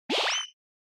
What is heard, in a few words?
Motion Agility Short